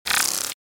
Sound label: Error noise